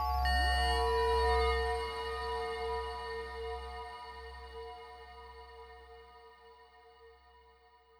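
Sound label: Heavenly Ambience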